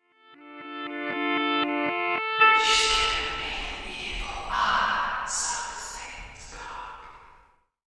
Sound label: reverse, rock; backmasking contrario